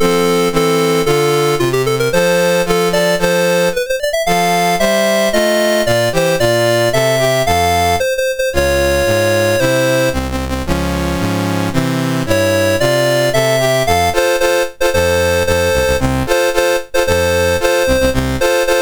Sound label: Libya, National Anthems, MOS 6581